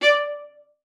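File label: D5, viola spiccato; Xsample VOL-5; 90 s Sample CDs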